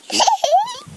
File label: laughter